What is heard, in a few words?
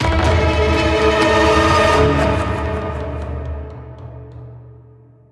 Goblin King victory; song of iron